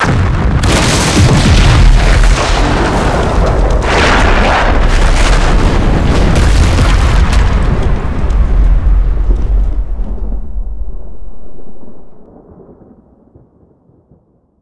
base explosion; ambient; Half Life 2; Episode 2; outland

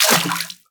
fs fm water, footsteps; Portal 2

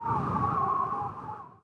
wind; ambient wind; Counter Strike Model Pack; CS:GO

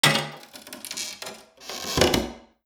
window strain; creatures; Half Life Alyx; zoo

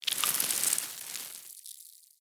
swarm small, cockroaches; critters

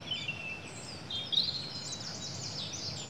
birds, ambient animal; Counter Strike Model Pack; CS:GO

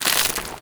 foley, zombie revived; Half Life Alyx

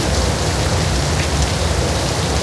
rumble rain; ambient

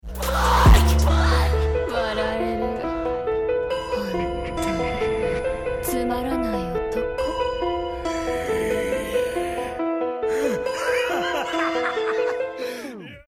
lost round, music

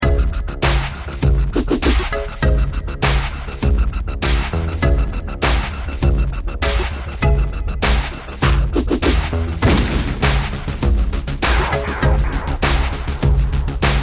bomb planted

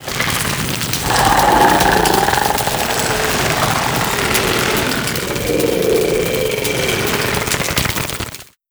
electrics, zombie revived; scripted intro; Half Life Alyx

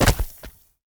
creep damage; Counter Strike Model Pack; CS:GO; survival

